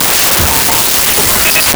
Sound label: starter; Sound Effects Library (28Cds)